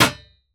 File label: Hammer Metal Impact